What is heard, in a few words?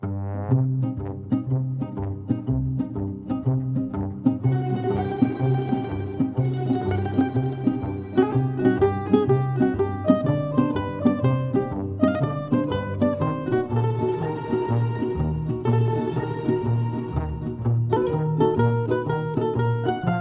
Balalaika, Rhythmic, Comedy Theme, Sneaky